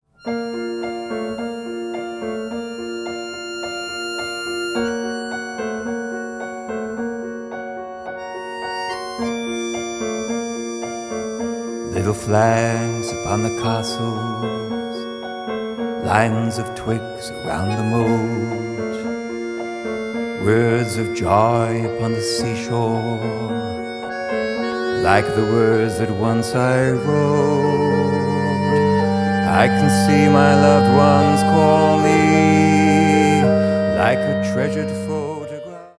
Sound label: accordion